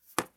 Laptop Closing; Fairlight Sound Lib

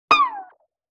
Violin Pluck Slide; Vintage Cartoon; Musical Elements

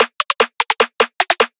Drum Roll 150; Trap and Dubstep

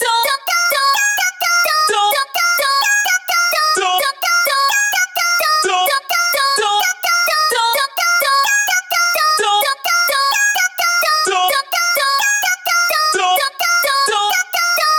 Vocal Arp - 128 BPM A# Min; House; Vocals; Prometheus

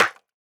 Snare - C, drum one shots; Snares; Cymatics; Eternity Sample Pack

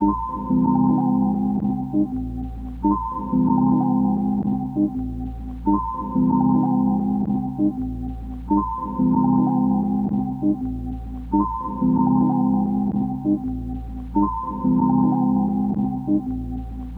Tape Loop Melody, F; Tape Loops; Melodies; Cymatics - Eternity Sample Pack

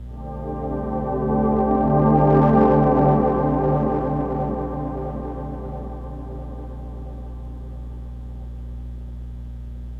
Tonal FX Cm 90 Bpm, Granular Tonal FX, Lo-Fi Kit; HipHop; Ghosthack; Advent Calendar

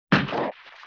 Gloop Hit; Vintage Cartoon; Hits & Tones

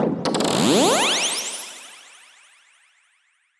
Ziptide FX; House; Various FX

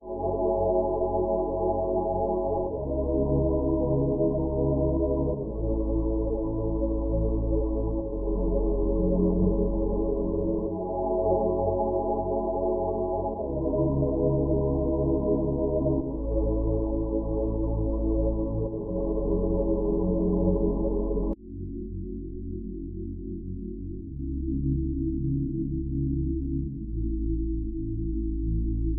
90 BPM C# Min Pad; Loop Stems; Cymatics; Violet

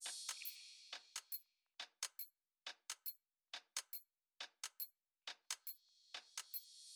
Drum Loop, 138 BPM Percussion; Loop Stems; Cymatics; Vibes II Drum Loop Collection; Rings

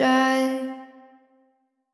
Wet One Shot - C, Tonal Vocal; Cymatics; Euphoria Vocal Sample Pack

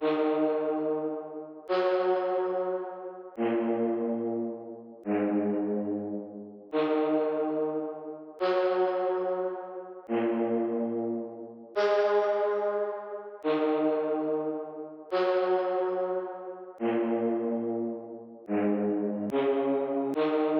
143 BPM D# Min Tonal FX; Melody Loops; Loop Stems; Cymatics; Hyperbass Pt 1

